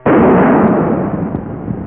bang; Java for 3d and VRML Worlds

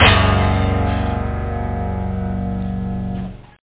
akord, guitarras

